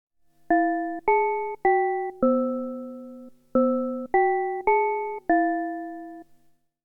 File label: Bells